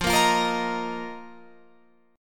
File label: F 7th Suspended 4th F down Guitar Open F; strummed